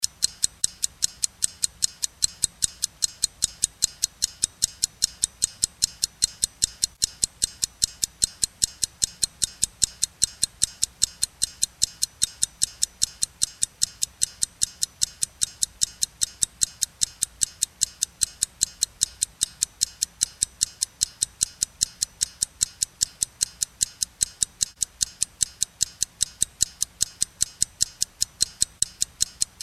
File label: clock